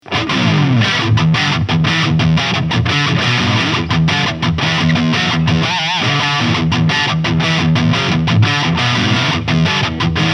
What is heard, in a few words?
Wing 800 Int PB Heavy Lead; Mooer GTRS Guitars